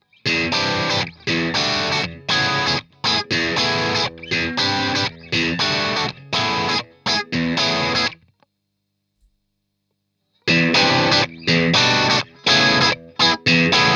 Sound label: JHS Screamer CH1 complex chords mid gain